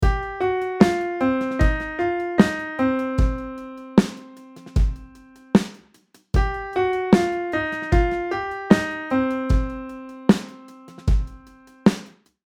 piano and keyboard blues licks; 10 cool piano and keyboard blues licks

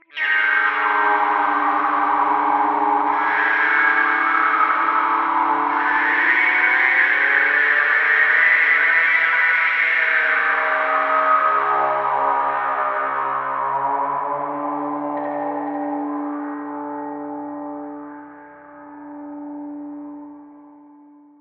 Alien Flux, spooky guitar sounds; electric

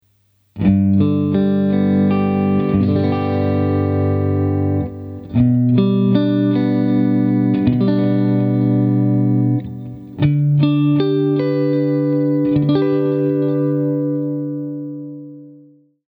G MAJOR TRIAD INVERSIONS, guitar; guitar and sing at the same time

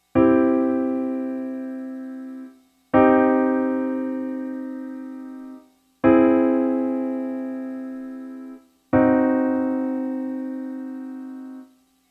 Triad Types; chordal harmony; keyboards

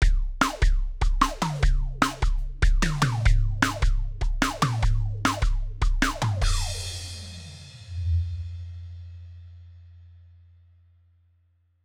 Filter Smack

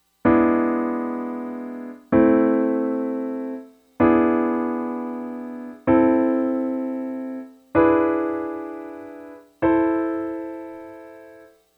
minor Dominant 7th resolution; chordal harmony; keyboards

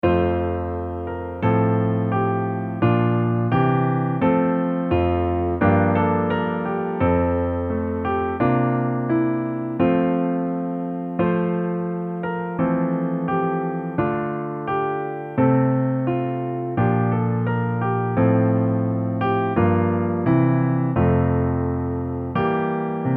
Spread Two Hands ritard; keyboards

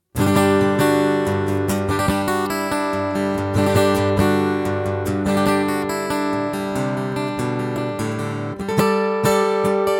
Yamaha FGX5 Test Pickup

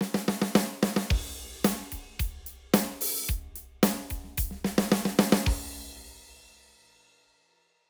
Steel Ring; drums; electronic

